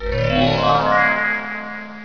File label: sound upgrade spaceship; Powermanga